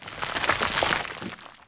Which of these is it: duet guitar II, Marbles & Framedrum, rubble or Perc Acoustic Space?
rubble